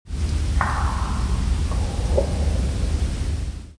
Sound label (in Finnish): revontulien aania pamaus; UntoKLaine